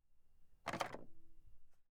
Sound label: cordless phone pick up set down plastic cradle